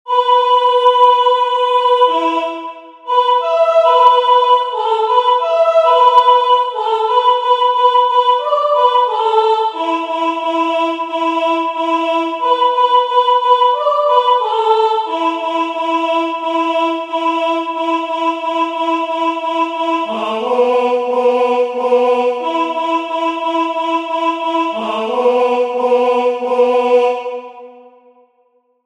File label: song comanche; The Ghost-dance religion and the Sioux outbreak of 1890